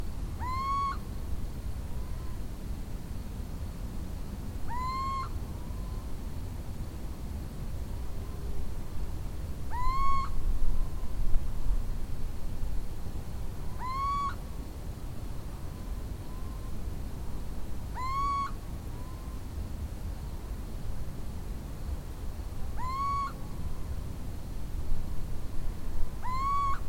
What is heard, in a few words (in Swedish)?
Minervauggla